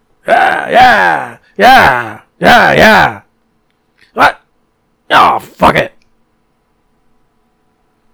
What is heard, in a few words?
chainsaw solo vocals